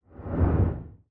Swoosh Transition

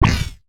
Sci-fi Pulse Gun Blast

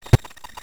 Beepy Glitch

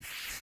Soft Slide Medium

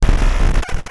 Mechanical Malfunction Rumble

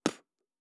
Mouth Puff

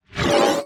Sci-fi Transition

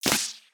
Sci-fi Pulse Gun Blast

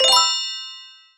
bells upward, rewards

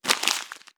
Dry Short Paper Crumple

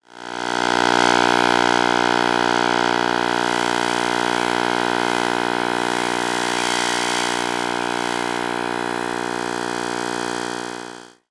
Long Buzzing Power Glitch

